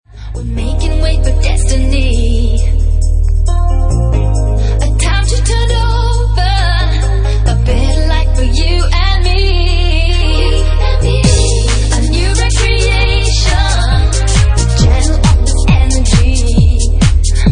Organ To Bass Remix

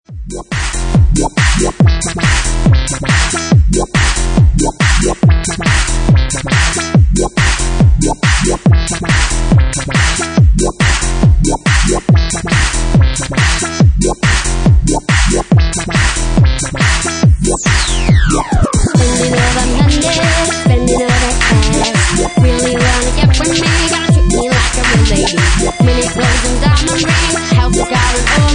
4x4 Bassline Remix

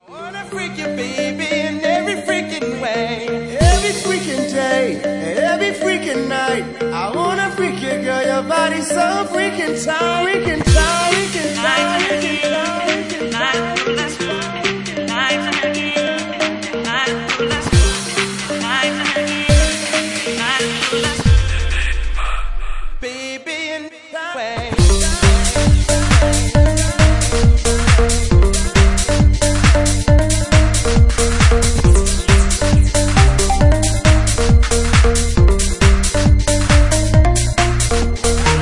Organ To Bassline

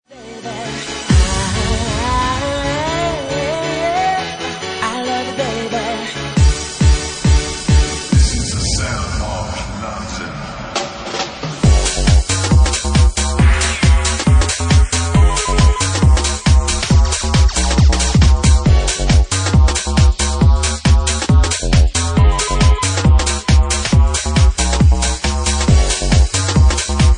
Bassline; Bassline Meltdown Session 1

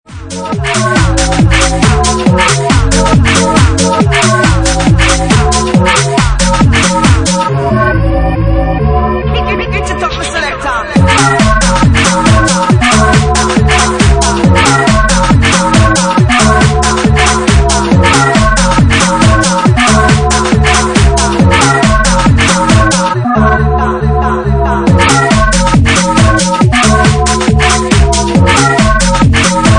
Siren Tune

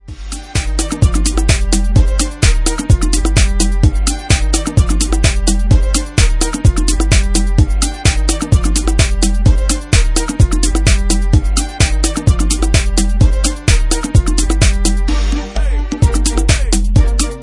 Orchestral Funk

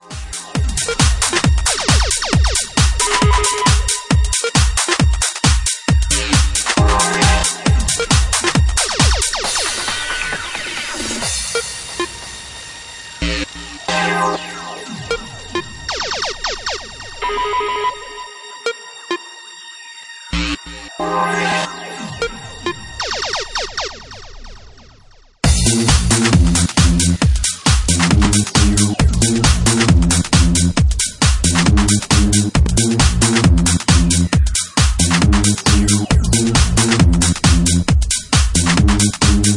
Speed Garage Remix

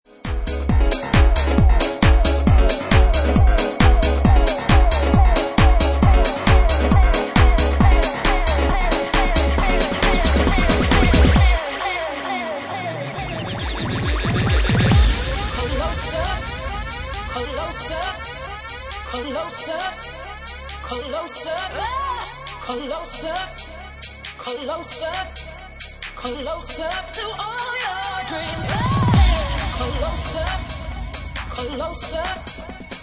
Organ

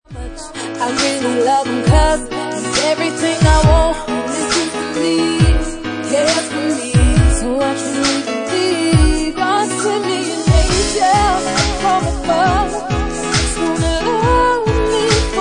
Organ 2 Bass Mix

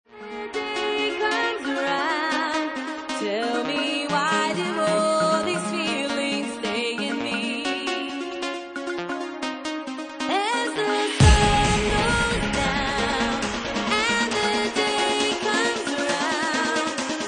Organ

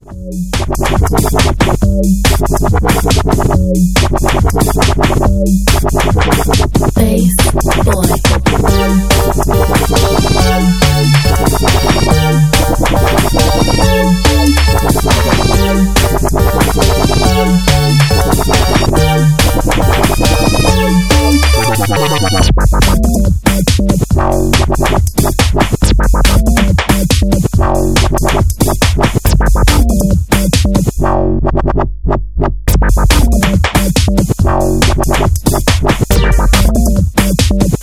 Wobble

